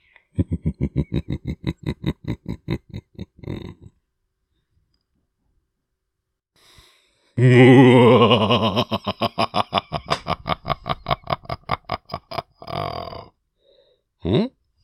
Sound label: A stupid laugh